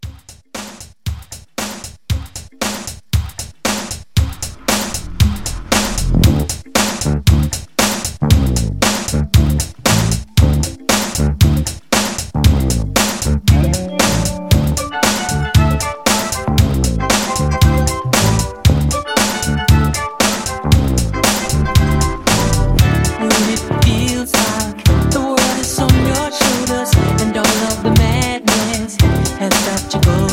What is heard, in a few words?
80s Redrum 116